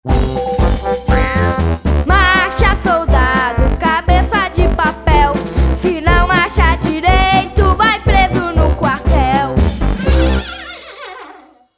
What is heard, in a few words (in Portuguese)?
marcha soldado